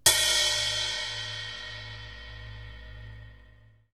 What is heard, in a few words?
splash cymbol complete